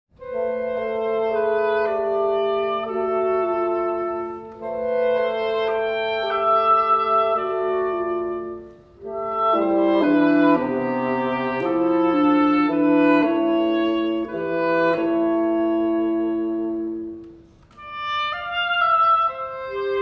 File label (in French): Suite Pour Trio D'anches; Rustiques; Mvt. 1. Dialogue